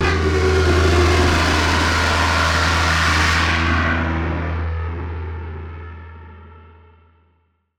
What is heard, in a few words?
Hard Worker; martyn stonehouse